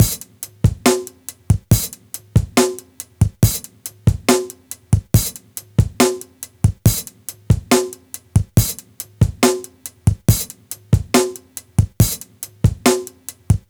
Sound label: 70 break